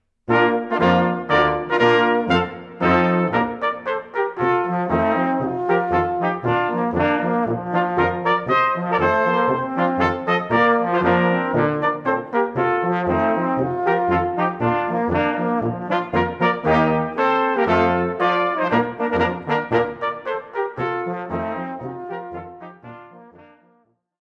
Felsenfest Marsch

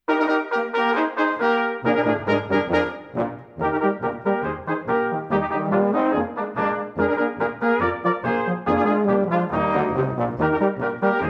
Schneider Polka

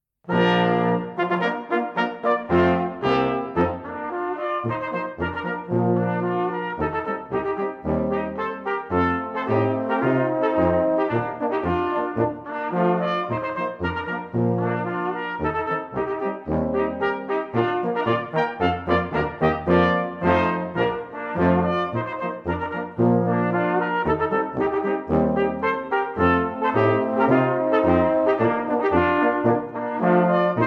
Marsch; Haas

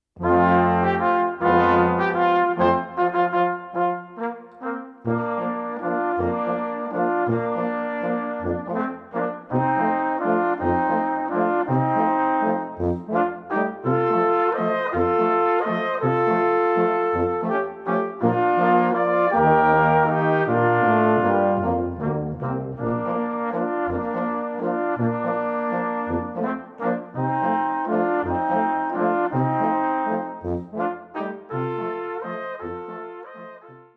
Schneewalzer

